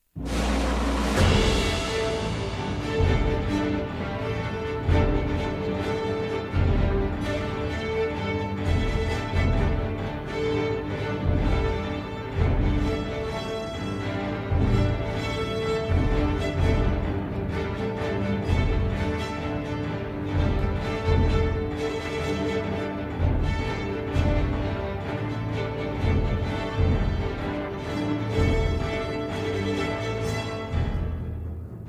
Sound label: Allegro energico, London Symphony Orchestra